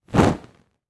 Fire Torch Movement; Fire and Explosions

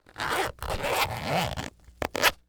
Technology Electronic Zipper Unzip CD Case

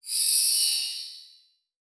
Flash Wipe; Multimedia Internet CD-Rom Flash

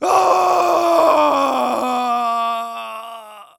Cartoon Human Male Scream Torture Scream

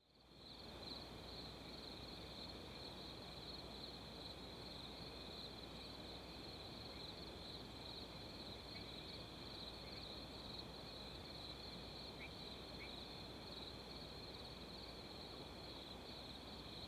Ambience Wind With Crickets; AdobeCC

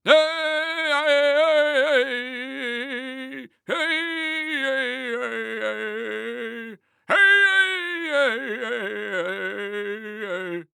Human Indian Chant Voice

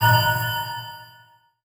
Multimedia Internet CD-Rom Flash Beep Low Pitch

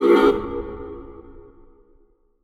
Title Transition Digital Hit; Production Element Title Transition

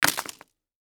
Crash Cement Block Drop On Gravel; Crashes

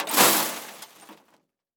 Glass Debris Dropping On Metal; Crashes

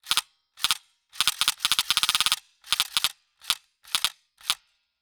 Foley Toy M16 Gun Firing

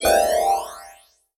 Beep Evolver; Adobe; Multimedia Internet CD-Rom Flash

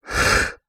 Animal Mammal Carnivore River Otter Snarl; animals; AdobeCC

